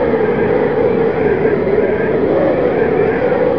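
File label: ambience wind howling loop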